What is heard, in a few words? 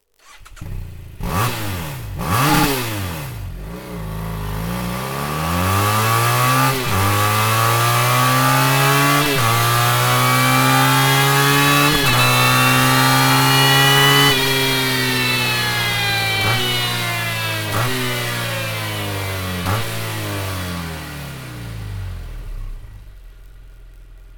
akrapovic slip on exhaust bmw f700 gs f650 gs f800 gs adventure